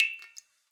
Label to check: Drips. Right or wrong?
Right